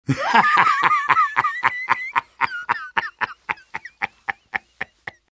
sniper laugh long; vo

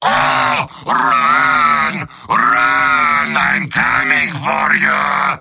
yell; heavy; mvm

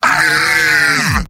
battle cry; heavy; tf2; mvm

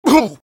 pain sharp; engineer

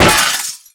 robo impact soft; physics; Garry's Mod; tf2; mvm

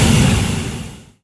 flame thrower end; tf2

